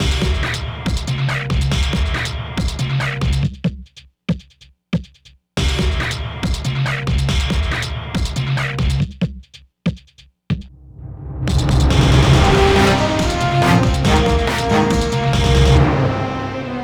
first fight music; Ben 10 Protector of Earth; Wii

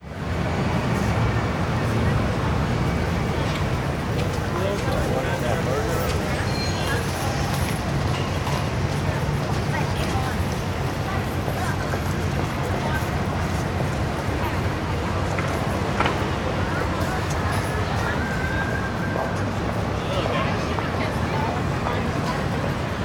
Gateway Arch Pedestrians

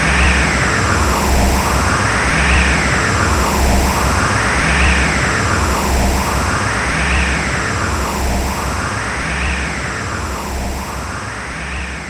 Enemy Hourgrass Active Loop

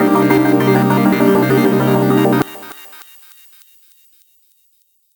Fill Chord Glitchy 200 C

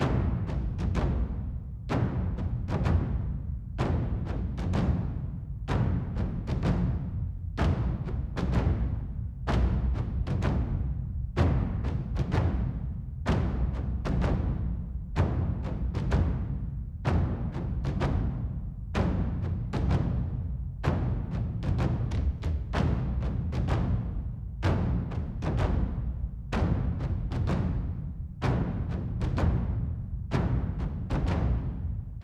Stomp Drum Loop; Mus Ch12 Freighter

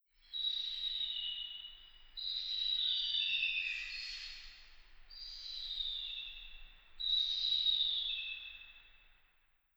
Zion Eagles

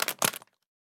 Pick Up Item

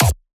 Hardkick Am Nobass; Hardkick Am Nobass2; exciplex sample presets pack; JinoBeats

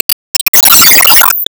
Enemy Roadmechanic Wall Drain

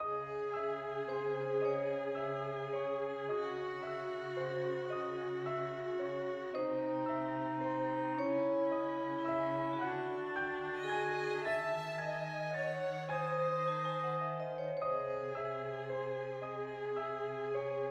Piano

